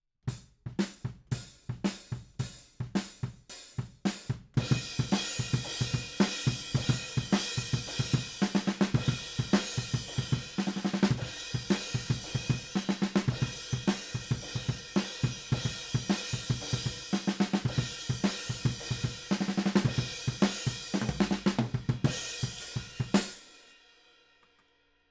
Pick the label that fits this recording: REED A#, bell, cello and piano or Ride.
Ride